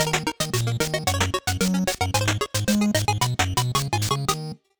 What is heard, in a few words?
Turkey Hunt Game End Sting